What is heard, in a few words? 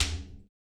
RIT STICK, Ethno Techno RITUAL; ILIO - Ethno Techno